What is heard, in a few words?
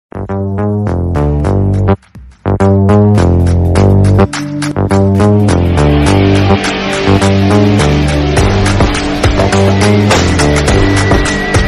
instrumental bgm ringtone; ek tha tiger; salman khan